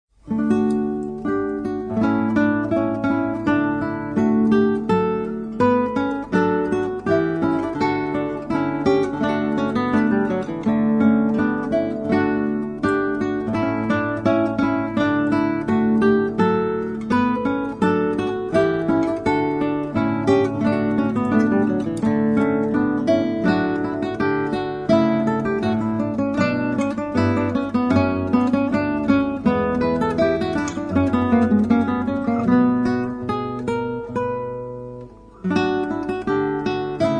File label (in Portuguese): brincadeira para dois alaudes, guitarra classica